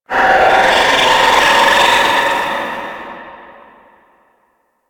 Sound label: Raptor Spawn Scream; Warframe